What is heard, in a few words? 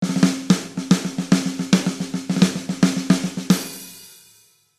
drum march, jingle